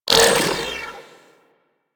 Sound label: Droid Array Stop; Warframe; Cephalon